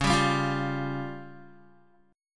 C sharp Major Flat 5th, Guitar; C#Mb5 strummed; JGuitar

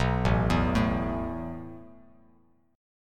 A Suspended 2nd A Bass Standard; Asus2 arpeggiated

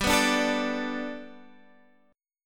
G Major Add 9th Guitar Standard, chord; x,10,9,7,10,10; Gadd9 strummed

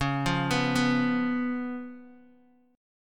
C sharp 7th C sharp, Guitar DADGAD

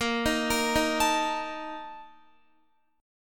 Bb Major 7th Suspended 4th, Guitar

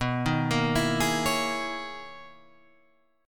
B Major 13th B down, BM13 chord; arpeggiated; JGuitar

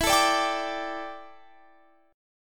D Suspended 2nd Suspended 4th E

